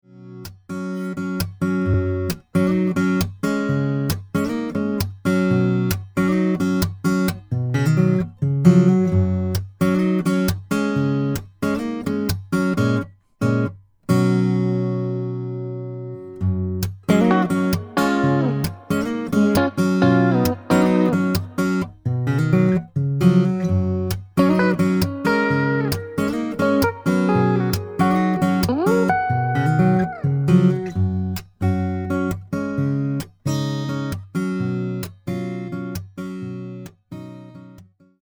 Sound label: instrumental